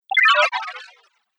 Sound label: Arcade Game Laser Bits And Blobs; Video Game Sound Effects; Retro Inspired Sound Effects - Think Nintendo, Atari, C64, Etc.